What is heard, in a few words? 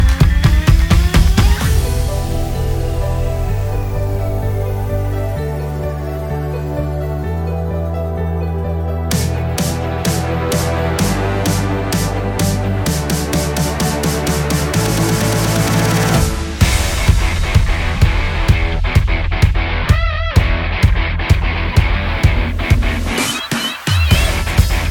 Audition Cut Instrumental; descendants